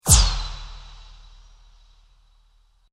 ENERGY WEAPON DISCHARGE INSIDE, Created energy weapon effects; Machinery